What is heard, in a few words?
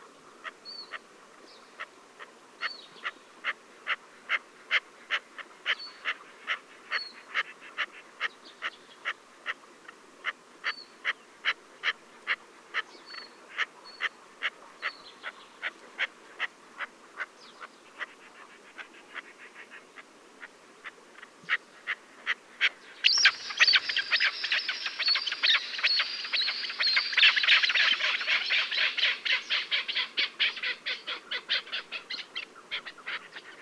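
chukar voice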